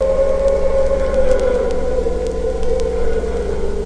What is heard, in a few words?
darkplanet; Various Strange Sounds and Effects